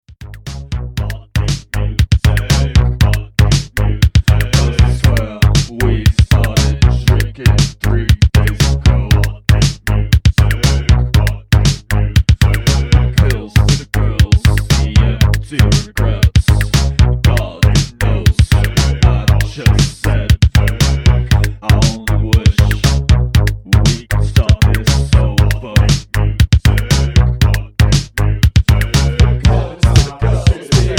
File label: tense